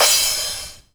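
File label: cymbal, dance drums; Best Service ProSamples vol.15; AKAI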